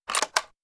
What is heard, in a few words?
shotgun reload